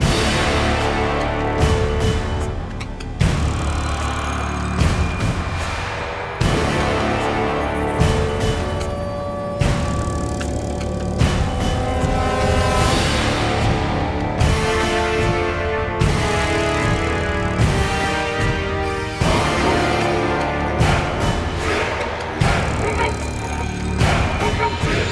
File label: Bossfight; The Witcher 3 Wild Hunt; Q202 Ice Giant Bossfight Stage 01